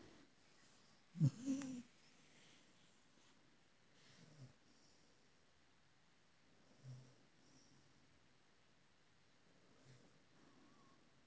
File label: Buddy field, Home